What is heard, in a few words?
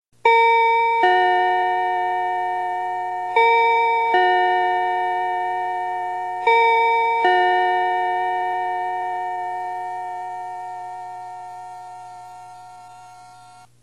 melody, system clock; densetsu